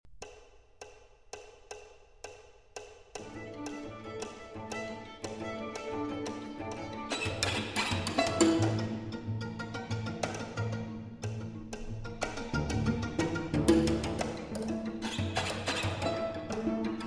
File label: String Quartet, Con precisione